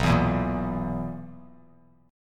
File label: B Major 7th Suspended 4th B down Bass Standard; BM7sus4; strummed